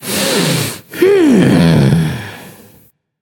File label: breath, voicepack; smite; jorm